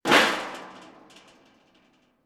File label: Metal Sheet; 99Sounds; The Warehouse